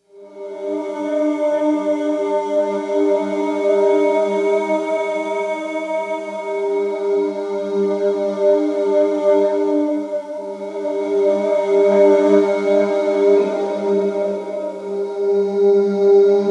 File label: sing wrong; Polyend